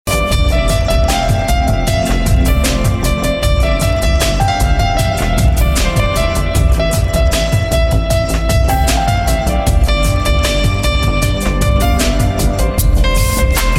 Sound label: Piano Mix